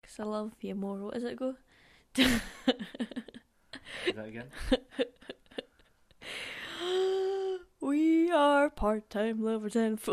singsong

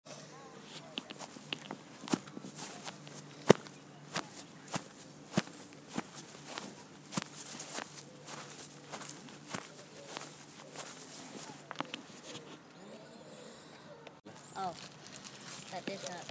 footsteps

